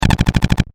Science Fiction Pulse Rifle Machine Gun Short Burst; Battle